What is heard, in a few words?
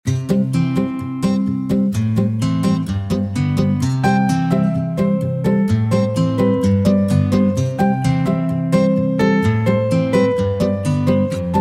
acoustic band